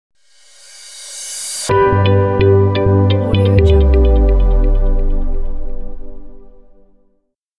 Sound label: intro corporate logo; music-fish